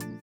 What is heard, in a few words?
VIBRA; Spectrasonics; Metamorphosis